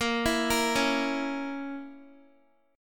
A sharp Diminished Guitar, chord; A#dim; arpeggiated

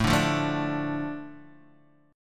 A Suspended 4th Sharp 5th, A, Guitar, Standard; Asus4#5 strummed; JGuitar

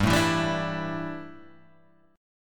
G 6th Suspended 2nd; G6sus2 strummed; JGuitar

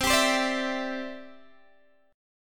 C Major Add 9th, Guitar DADGAD

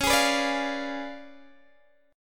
C sharp 7th Flat 9th Guitar Open G, chord

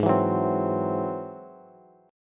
Ab Augmented 7th Ab down Bass; Ab+7 strummed; JGuitar

